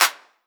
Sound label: Clap, 909; Slate; Vintage Drum Machines Volume 3 909 Edition